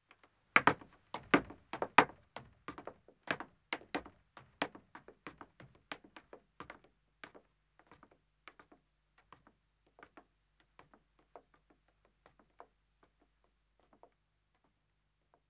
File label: Wooden Stairs Ascending, 1 Man, Footsteps; BBC Sound Effects Library; Footsteps II